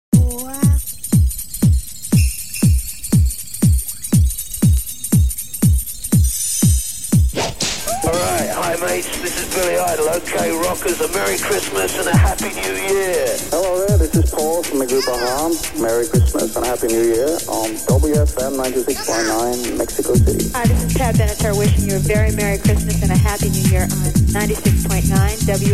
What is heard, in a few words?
Jingle bells; 1994